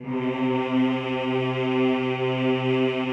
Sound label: CHO, Mmhh; Sound & Vision; Gigapack I CD 2; CHO Mmhh + Rrrr